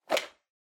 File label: Megaphone Hardware Mic Holder Take Out